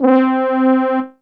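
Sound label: E-Mu Proteus FX French Horns C4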